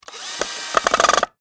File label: Industry Electric Drill Tighten Screw In Wood; Drills; Tools